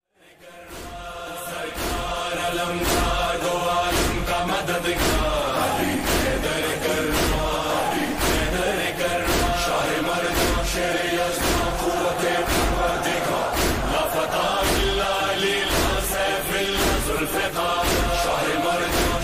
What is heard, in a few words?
noha ringtone